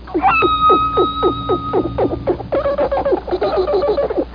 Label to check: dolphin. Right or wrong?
Right